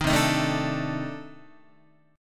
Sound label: C Minor 6th Add 9th C Guitar; Cm6add9 strummed; JGuitar